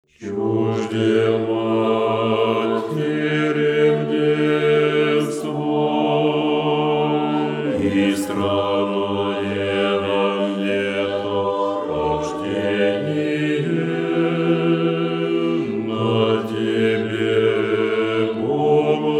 Bas Irmos 9 y pesni; Rozhdestvo Bogoroditsyi

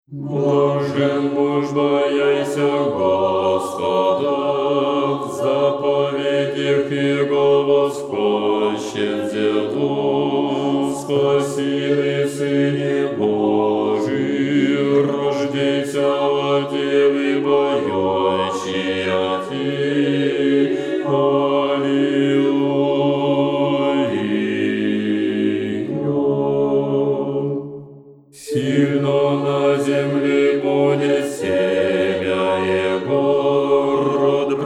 Bas Rozhdestvo Hristovo Antifon 2

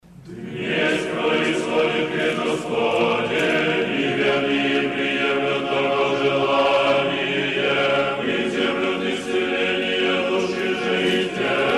Vozdvizhenie Chestnago i Zhivotvoryaschago Kresta Slava i nyne Stihira na hvaliteh

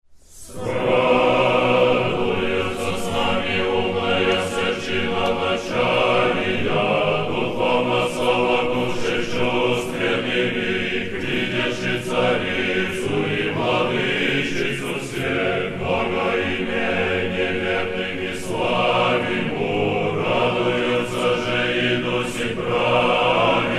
Hor Pokrov Stihira na Gospodi vozzvah; Moskovskogo Sretenskogo monastyrya

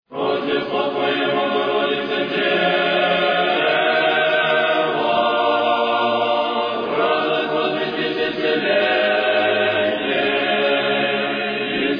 Hor Tropar i kondak prazdnika Rozhdestva Presvyatoy Bogorodicy; Minskoy duhovnoy seminarii

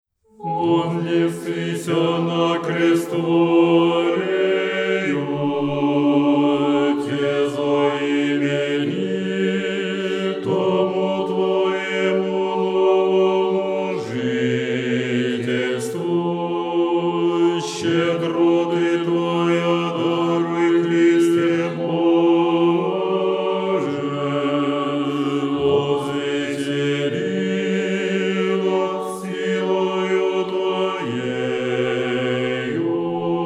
Bas Vozdvizhenie Kresta Gospodnya Kondak

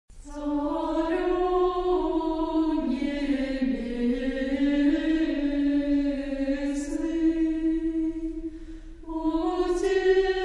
Sestrinskiy hor Svyato Vvedenskogo zhenskogo monastyrya Caryu Nebesnyy znamenn; g Ivanovo; raspev

